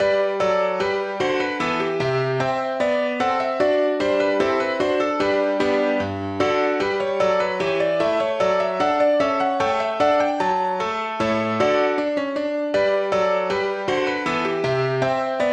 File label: Bourree in G Major